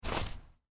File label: Footstep snow boot, Boots: Individual Footstep On Snow, Heavy; Human